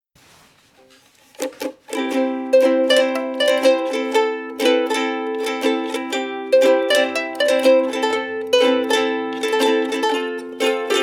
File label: Happy Ukelele